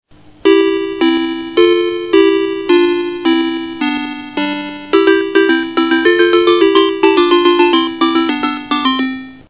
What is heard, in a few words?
door; PCgame